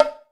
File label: Low Bongo, Alesis D4